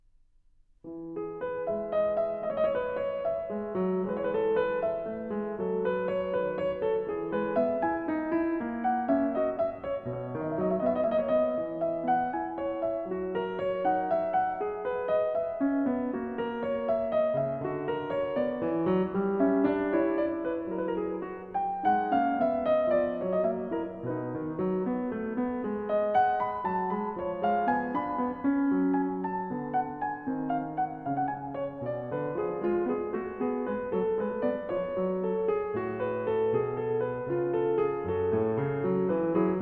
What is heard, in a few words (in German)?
Präludium E-Dur; BWV 854; J.S. Bach; Das Wohltemperierte Klavier Book 1